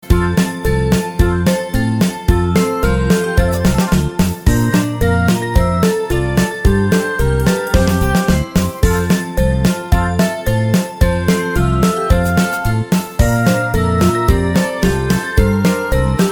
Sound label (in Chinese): 贺卡音效; 春节贺卡